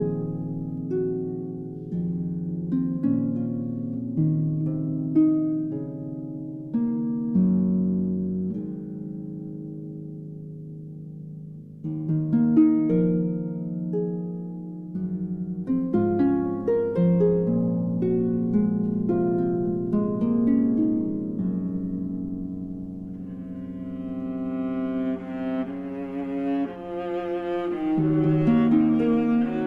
Harp Concerto Lullaby

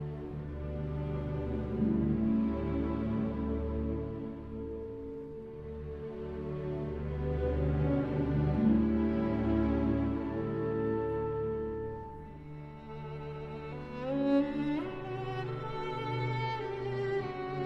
Meditation in D for Violin and Orchestra; Glazunov, Alexander; Op 32

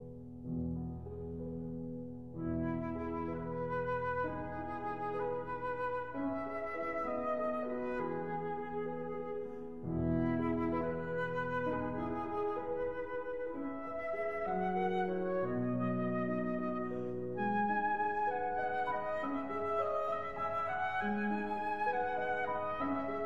Berceuse (Lullaby) for flute and piano

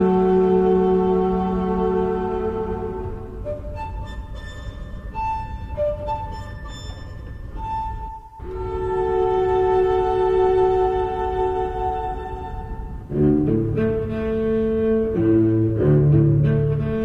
Lullaby for String Quartet

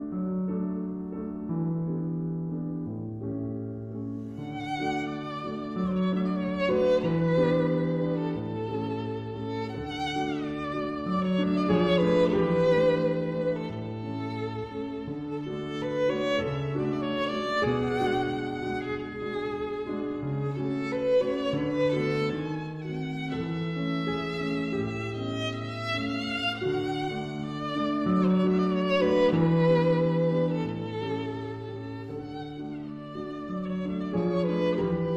Violin and Piano; Cavatina

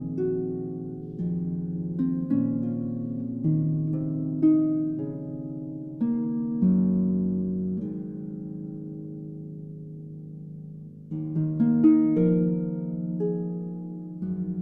Harp Concerto Lullaby

